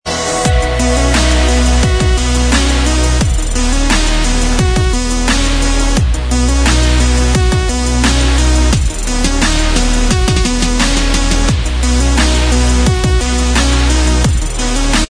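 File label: instrumental track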